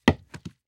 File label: Impact Coconut Drop